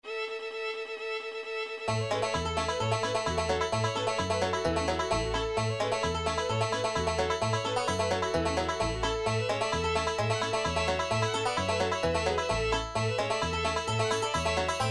bluegrass

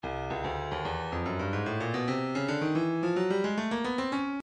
breakbeat midi 110 bpm; chuffed c; Stylus Breakbeat Midi Files

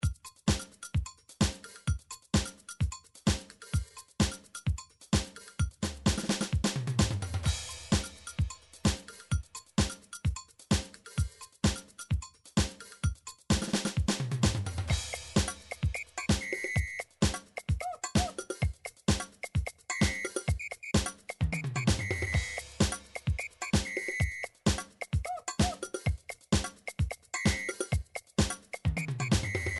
drum samba

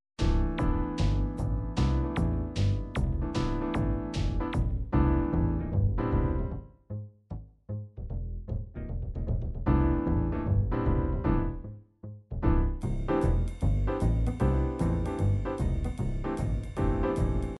jazz uptempo jazz, midi

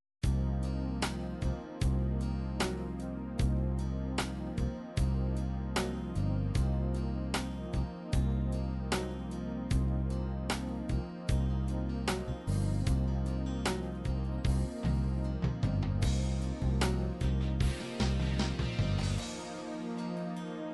ballad soft rock

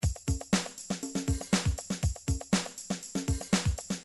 drums rap, midi drums

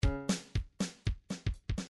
midi file grooves pop

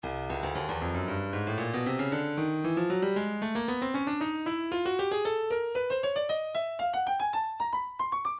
Percussion Bongos, midi; Stylus

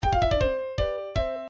miss; Gameboy; Super Mario Land

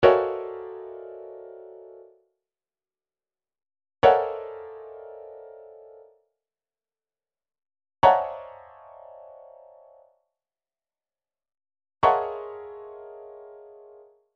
chord lines F#, midi; Midi Files 1000

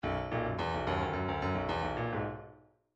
bdf midi file grooves funk d funk d

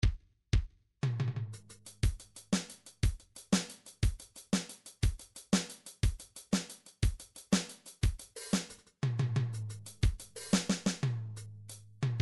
drums disco, midi drum patterns

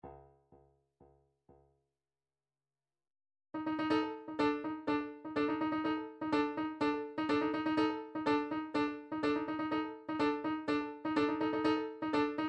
drum trax per

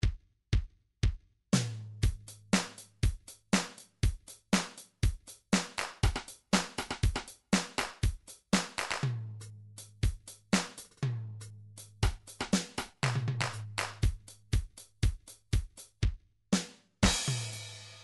midi drums electro

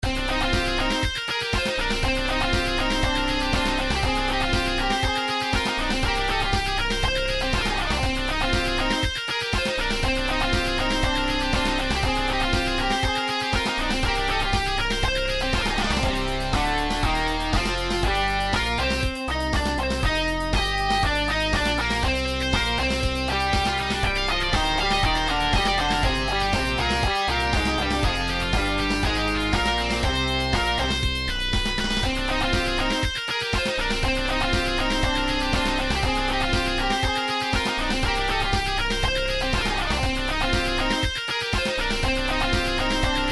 game over; gameboy; high speed 2

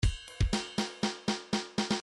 rock, midi

